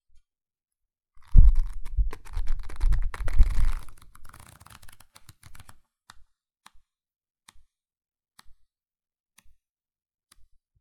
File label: Flipping Through Pages Of A Book Fast And Slow